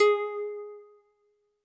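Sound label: Jazz Harp G#3; Kawaii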